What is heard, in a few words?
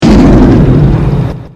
boom